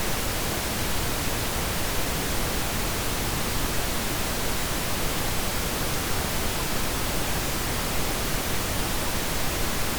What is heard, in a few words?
pink noise